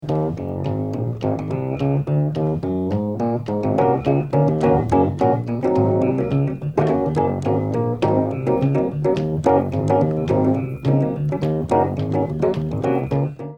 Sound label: rhodes suitecase mk3